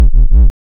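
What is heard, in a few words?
waveform FM triangle sine silence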